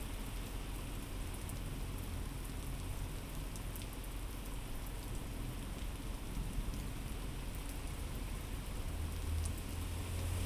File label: Binaural, walk; Field recrding walk